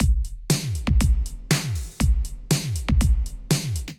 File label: 709 Kit, Drums; Ableton; Factory Packs; Singularities